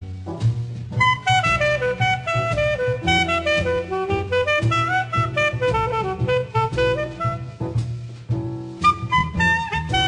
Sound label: Koto Song